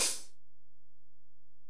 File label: HiHat, Alesis DM-PRO